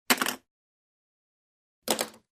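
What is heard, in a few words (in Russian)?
звук бросания ручки на стол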